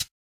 quiet pedal hi hat sample B key

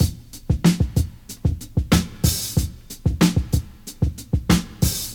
93 bpm fresh drum loop sample e key

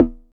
tr 909 electronic tom b key

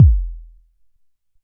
round kick drum one shot c sharp key

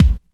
natural kick drum single shot g sharp key

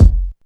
bass drum one shot f sharp key; kick drum

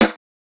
roomy acoustic snare sound b key

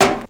dark steel snare drum sound f sharp key

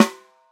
subby snare drum a key

